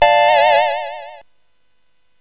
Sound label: Fast Sfx, CSG8580R5 sid